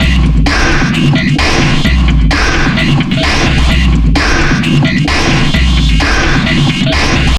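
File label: Loop, beats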